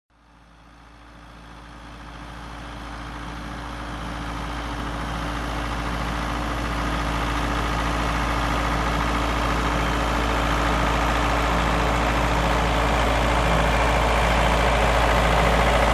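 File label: sound of a tractor passing by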